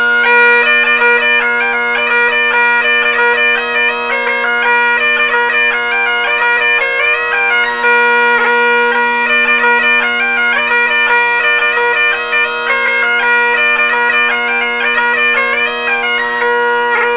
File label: Highland Picnic Bagpipe; 2007 Highland Ball